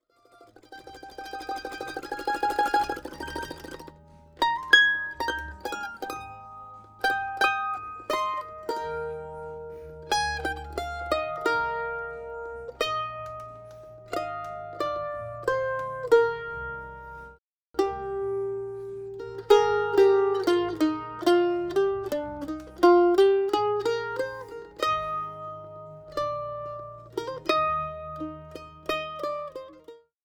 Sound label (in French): mandoline sans; psp 2445